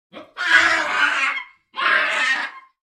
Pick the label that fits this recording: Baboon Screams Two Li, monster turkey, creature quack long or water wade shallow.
Baboon Screams Two Li